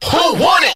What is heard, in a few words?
who want it crowd; questions